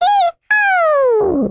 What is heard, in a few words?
Classic Miss Sound; Jolly Battle